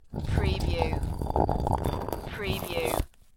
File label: Rock Grind - Scrape Slide Rattle